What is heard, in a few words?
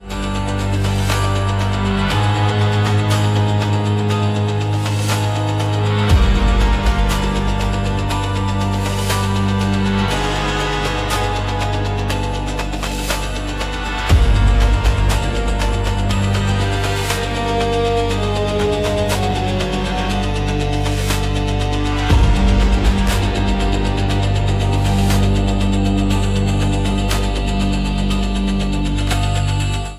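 echoes after fire, Post-Apocalyptic Ambient Music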